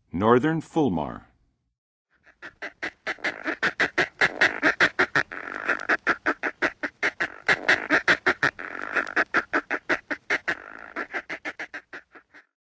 Northern Fulmar; North America; Western USA; Western USA Songbirds

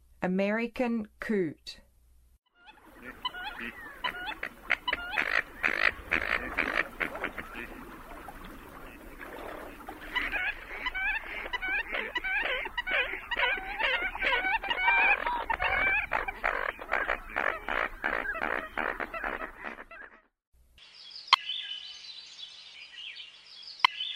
American Coot; North America

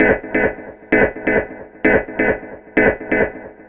Synth, Techno

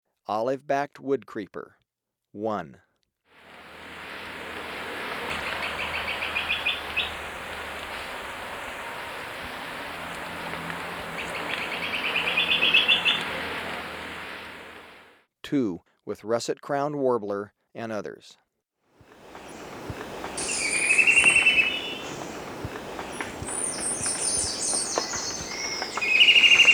Olive-backed Woodcreeper; S. America

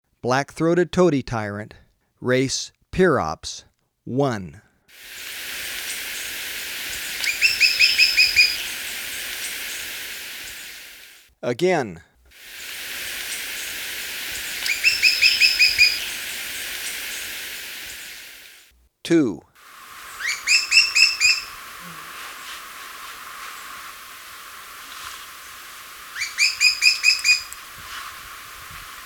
Black-Throated Tody-Tyrant, Birds of Ecuador; S. America

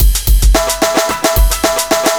block rock kit

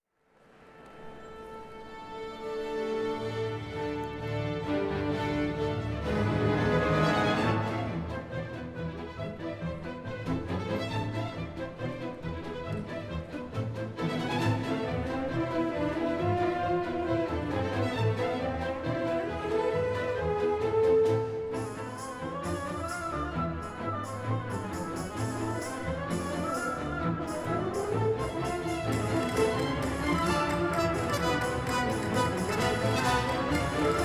Polka

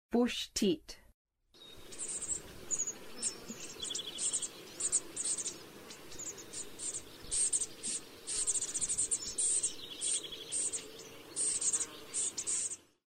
Common Bushtit, Songs of Birds of North America; Western USA; Western USA Songbirds

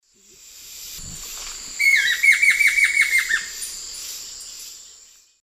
Xiphorhynchus guttatus, call; S. America; Eastern Lowlands

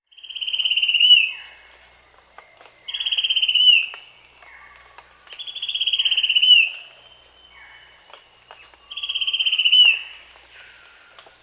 Spot-winged Antshrike (Pygiptila stellaris), Peru; S. America

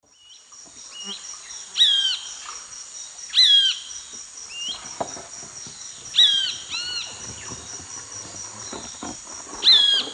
Pitangus sulphuratus, calls and song; S. America; Eastern Lowlands

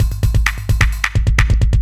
electro kit 130

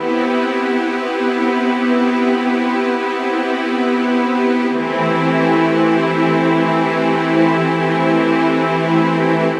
R&B

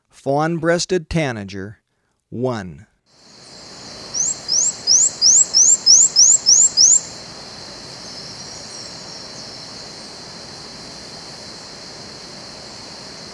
Fawn-breasted Tanager, Birds of Ecuador; S. America

